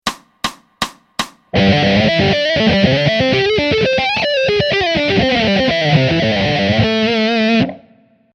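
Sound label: A min Pent in 5ths with Slides fast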